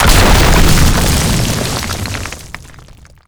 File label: rock explosion layer; Explosions; Call of Duty Modern Warfare